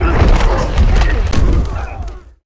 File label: Football Hit Tackle